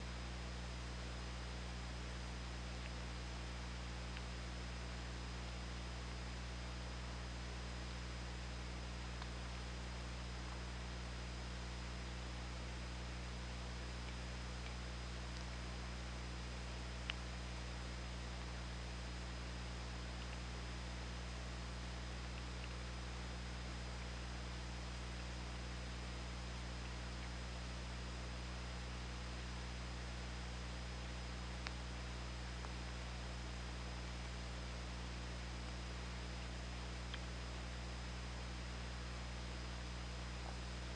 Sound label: OrcaLab